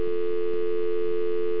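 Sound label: new dial, telephone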